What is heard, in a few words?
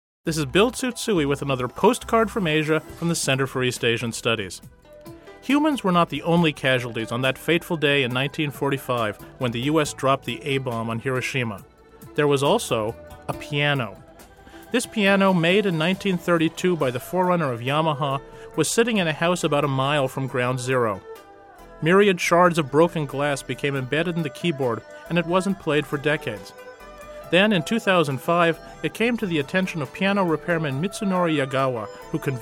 piano; Hiroshima